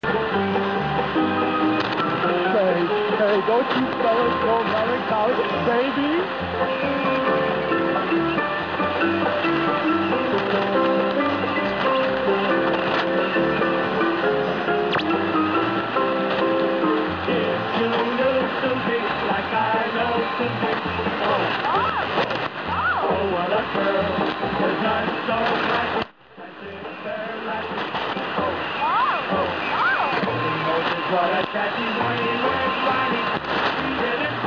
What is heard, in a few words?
polka radio 6261 khz; polka radio melbourne